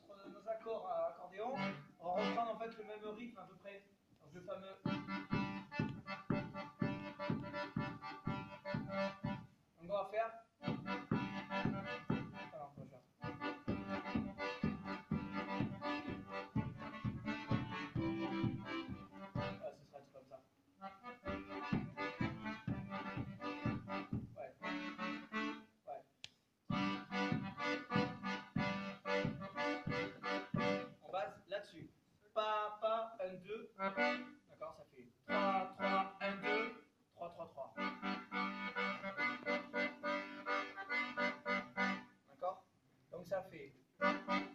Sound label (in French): Accompt diatos, Mazurka